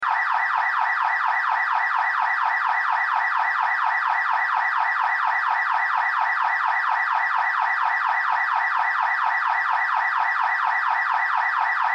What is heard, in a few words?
Car Alarm Loop; Transportation Automobile; Car Sound Effects; Blastwave